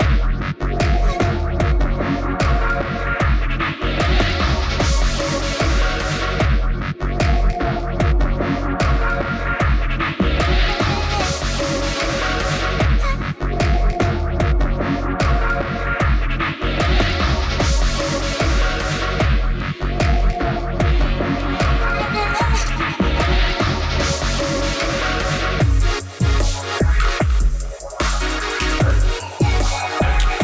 くらっと, dark sound

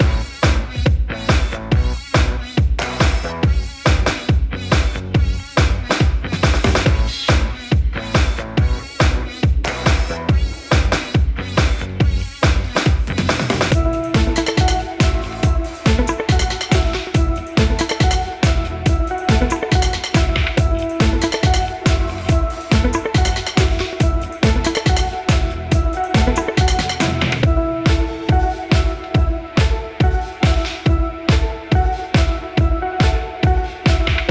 ファンタスティックビーツ, rock sound

